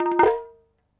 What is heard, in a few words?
hi flick, tabla; Dirt-Samples